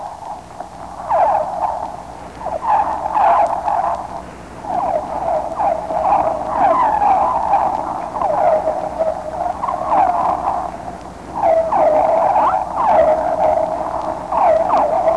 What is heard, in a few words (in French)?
beluga chant